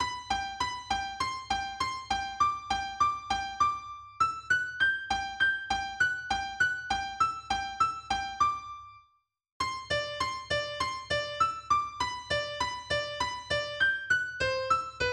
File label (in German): Andantino in G Dur Solo EGit; Melodie-Solos; Carulli